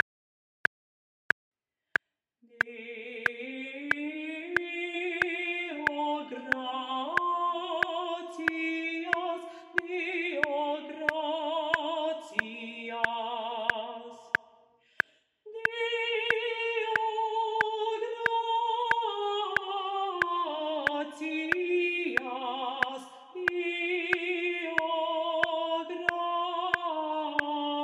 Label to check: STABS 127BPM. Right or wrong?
Wrong